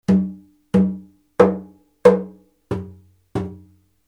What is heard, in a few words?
drums